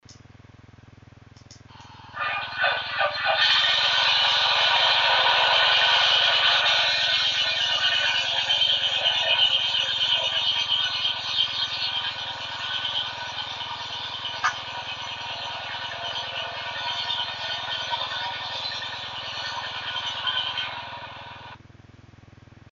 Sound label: Spitfire Engine start